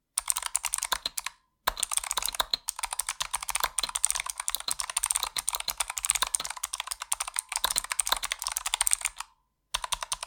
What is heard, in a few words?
soundtest huntsman v2